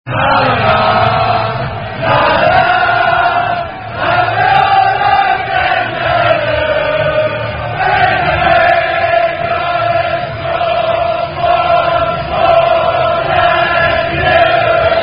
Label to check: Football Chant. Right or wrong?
Right